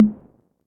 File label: Tom Hi 606; Drum Machines; Drum Hits